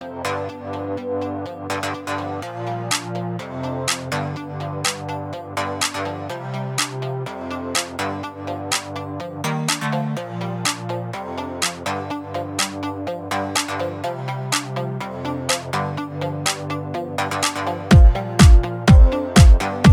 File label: Favorite Song Instrumental Edition